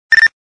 alerta beep nextel

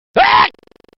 Scientist Scream

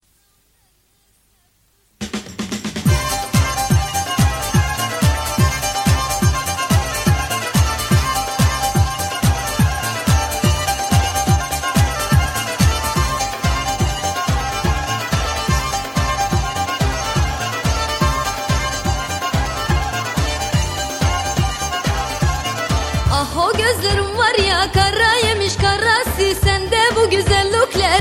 kemene

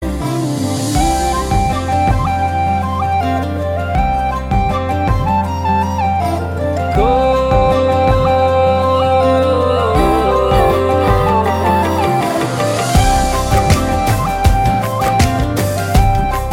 Flute

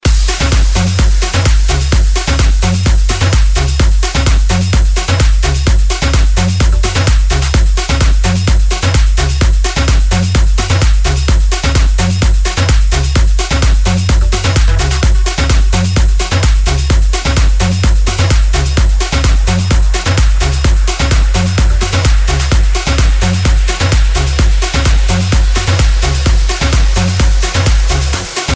dj mix music; hip hop

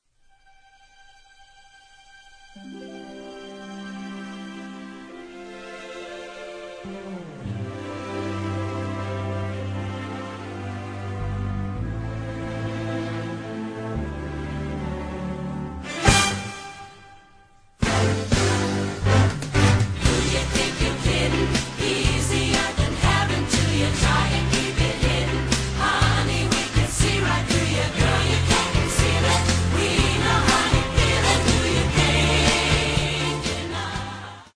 karaoke

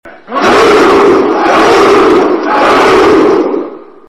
ahooahooo

